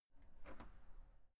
entrance door open short outside

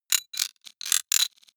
digital metal clicking, robot; comedy cartoon

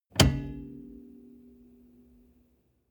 car bmw e46 interior seat lock in ring damped

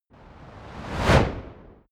fire torch bright whoosh; swooshes-swishes

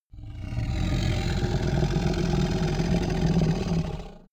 minotaur grunt short; kreaturen

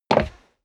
step leather shoes wood creak jump; schritte

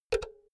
power cord heavy insert remove unsteady; objekte; elektronik

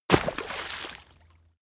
body fall water swallow; krieg-waffen; nahkampf; soundpool

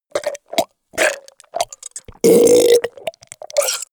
goblin wet burp nasty; koerpergeraeusche; comedy-cartoon; soundpool

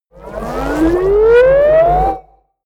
wobble glitch riser short, sciene fiction

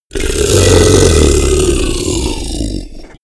beast grunt, comedy cartoon; kreaturen; soundpool

